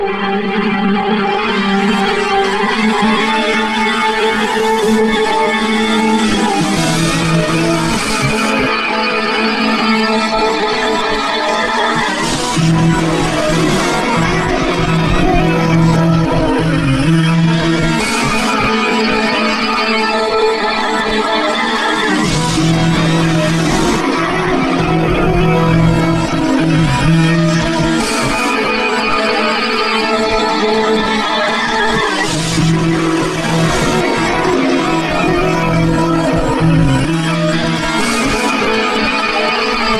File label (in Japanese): 迫りくる 悪霊 と ノイズ と